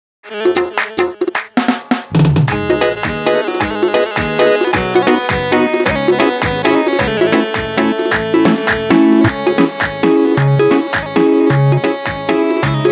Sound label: bakhtiyari